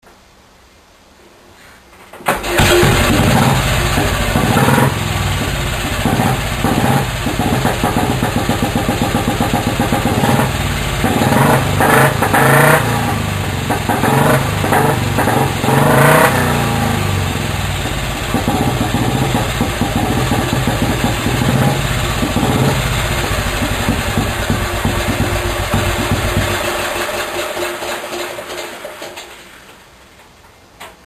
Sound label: Norton ES2